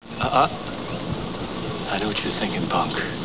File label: punk